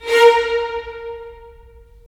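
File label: strings violins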